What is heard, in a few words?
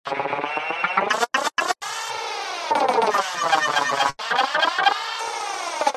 science fiction radio tuning broken; zapsplat